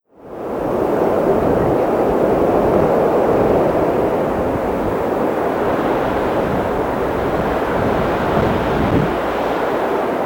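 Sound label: Cities Las Vegas Wind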